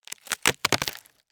Cardboard, honeycomb, crush, collapse, short; Eiravaein Works; Vaeyan IV

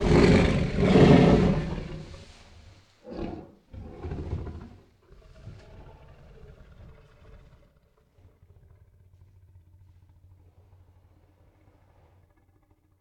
Tiger Softly Growls and Snarls; Sound Ideas

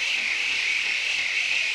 Arrow Flying Loop; SFX Kit; Foley - Misc

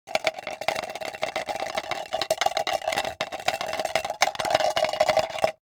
spinning; best service Blue Box; mixed sounds short

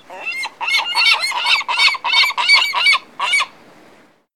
Bird - Seagull; RadwinIsland

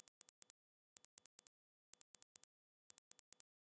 Telemetry Loop; Sci Fi; 1001 Sound Effects

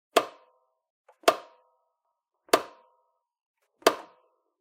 Generic Click Metal Medium; boom library; The Interface

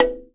Menu Click; Menu FX

